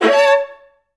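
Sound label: violas A3; Best Service; Peter Siedlaczek's Advanced Orchestra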